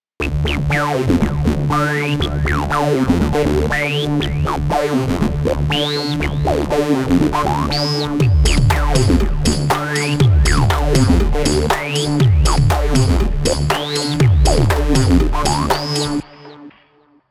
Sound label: ARP2600M other filter types